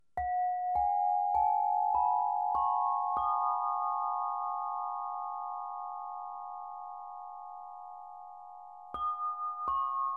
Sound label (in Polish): Arcana scale, harfa krysztalowa; Lekka harfa krysztalowa Arcana